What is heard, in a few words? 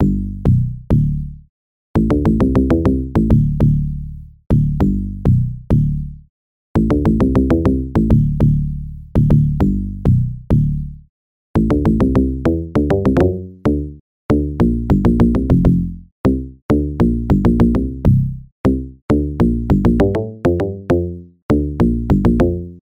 muted electric bass